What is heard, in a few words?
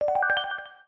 message complete ringtone; xiaomi notification; android